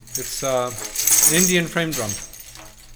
Indian Frame Drum; Sonic Foundry (Sony Creative Software) - Processed Drumkits Zero Gravity Beats; Terrestrial

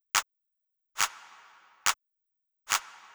drum Loop 140BPM Clap

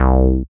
SYN TB ORIG, Roland TB-303; Sound & Vision - Gigapack I CD 2

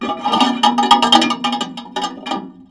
Raw, contact mic, Tin Of Guitar Strings; musicradar; contact mic madness

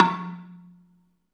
BELA2 F2, BELAFON, Asian Traditions; USB Soundscan vol.60; AKAI

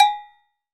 Agogo Lo; Roland BK-5; Percussion

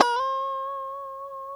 D 5 FUNK, STRAT, Guitars; Spectrasonics; Hans Zimmer Guitars VOL-1

